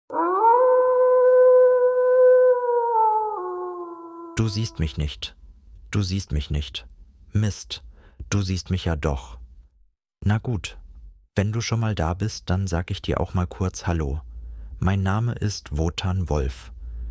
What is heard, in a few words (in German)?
Wolf; Wotan